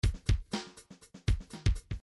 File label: midi grooves funk; Funk H